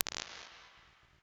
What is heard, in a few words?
room, chambers and large rooms; Ableton; Convolution Reverb